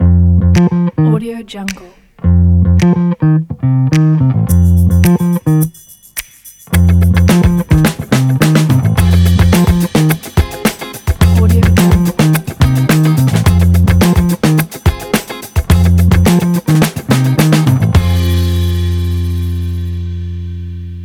funk and bass logo; vvalkietalkie

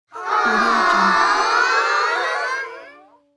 childrens affection

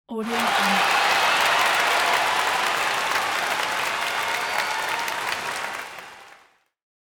large crowd cheers

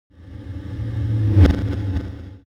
abstract reverse hit low rumble

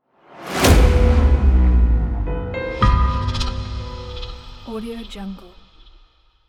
creepy dark opener

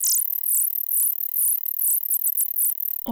computer compute old school

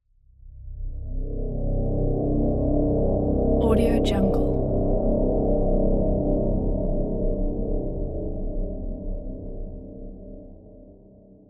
short dark drone

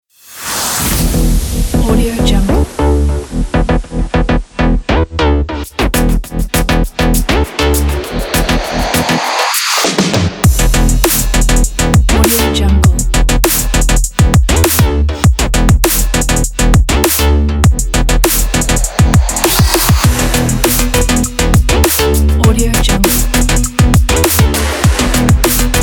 sport electronic beat

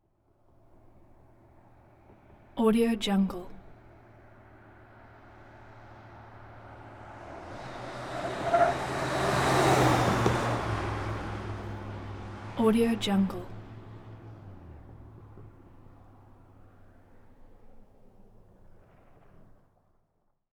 gmc sierra truck; 1988; prosoundfx

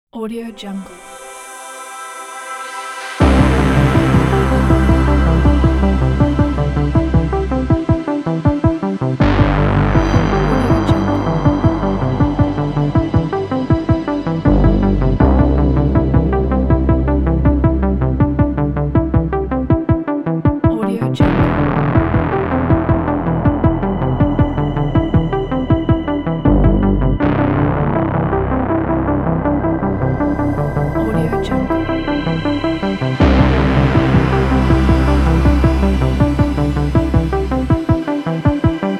golden synthwave

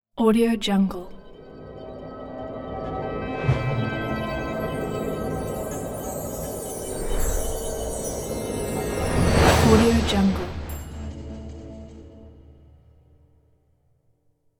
simple magical logo

